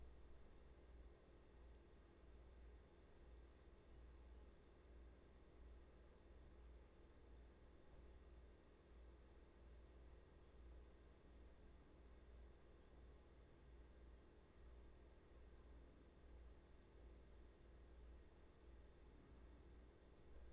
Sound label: roomtone